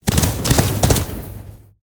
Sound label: Dreadsteed Attack